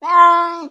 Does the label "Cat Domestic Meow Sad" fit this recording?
Yes